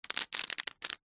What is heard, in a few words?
poker chips stack quickly on hard surface